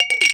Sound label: JAR CLINK, rhythmic hits; Rarefaction; Poke in The Ear With A Sharp Stick